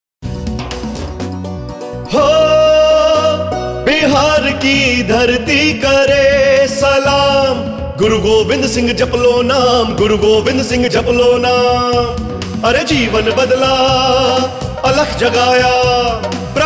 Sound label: Audio Jingle; Prakash Parv